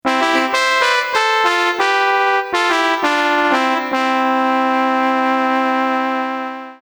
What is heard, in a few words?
Jingle Trombone